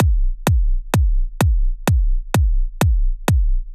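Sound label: Drum Loop 128BPM bass drum; VEC5; Drumloops; Multi Loops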